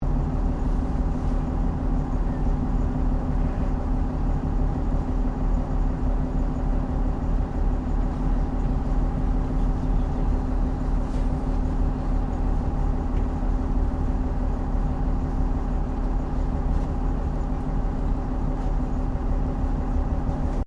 BOAT WATER TAXI LARGE IDLE LOOP, Large river water taxi; Williamstown; Boats (Sound Effects:Vehicles)